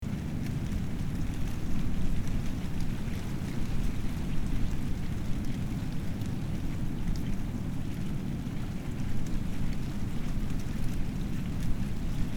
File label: Xcalak MX Tradewinds In Palm